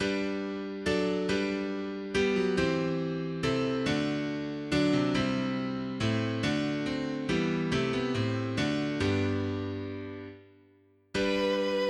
Lord of Earth and All Creation, piano

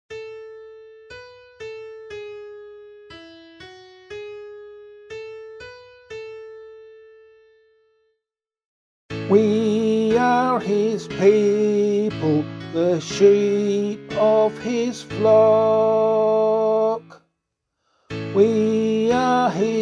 Psalm vocal

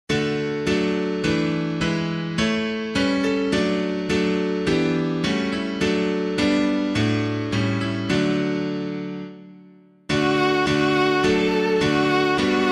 Unto Us a Child Is Given, piano

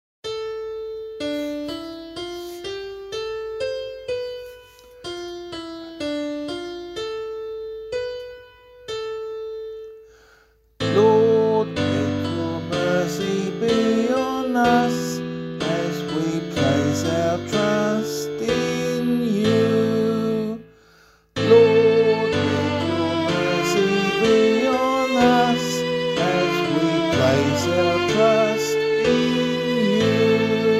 Lent 2 Psalm A - vocal; Sing the Responsorial Psalms

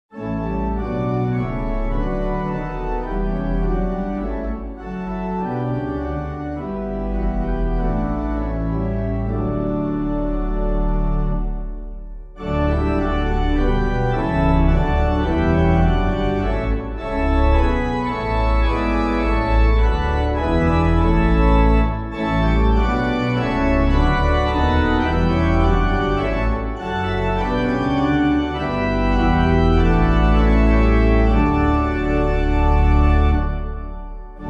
organ

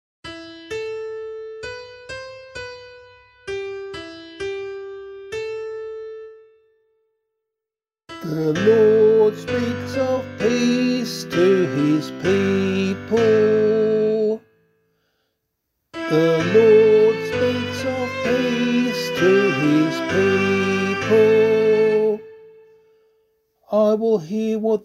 Psalm, vocal

